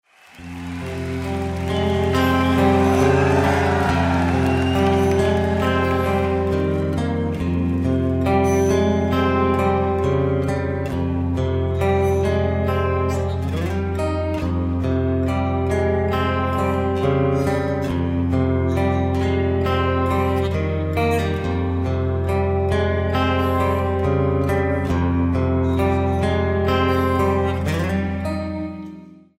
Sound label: clean